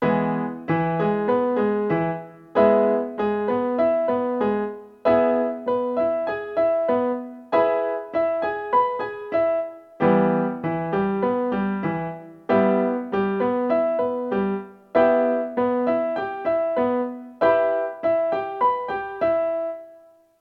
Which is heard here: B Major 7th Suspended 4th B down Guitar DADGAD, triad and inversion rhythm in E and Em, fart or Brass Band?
triad and inversion rhythm in E and Em